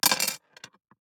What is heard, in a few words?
DICE on Glass, Throw and Roll, Standard, 3 Three Dice; Samples; ArticulatedSounds; DICE Sfx Party (Extended)